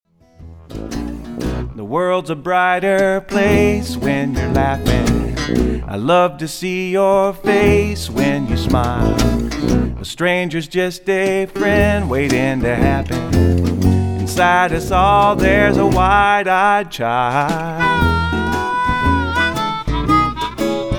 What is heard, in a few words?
Laughing